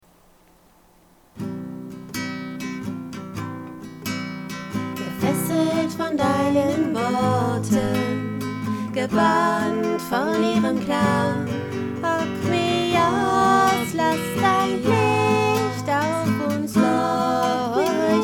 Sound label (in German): zweistimmig